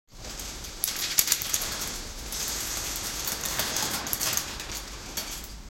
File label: basket rustling sound